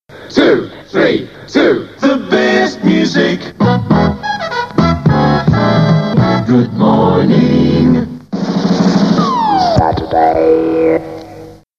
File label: Jingles